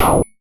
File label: StunGun; martyn stonehouse